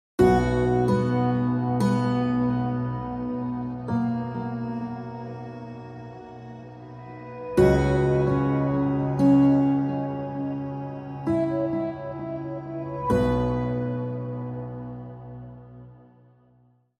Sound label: Bumper